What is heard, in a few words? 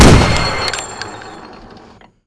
venom